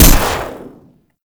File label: Electro Mag Shot B, Corpus weapons; Warframe